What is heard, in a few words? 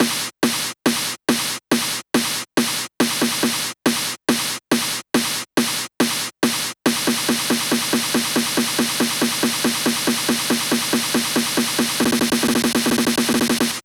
140BPM SNARE 8 Bars; Humiliation; Vengeance Samples; Snares&FX Pairs